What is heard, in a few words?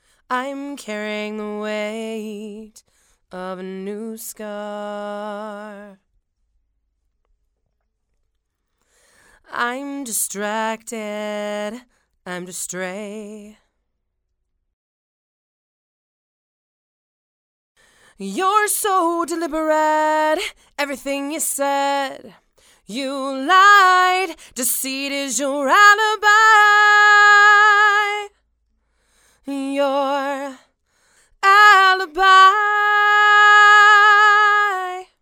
Female Vocals